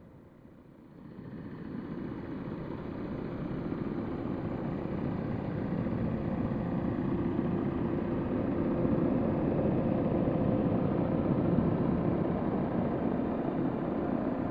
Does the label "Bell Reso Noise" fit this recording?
No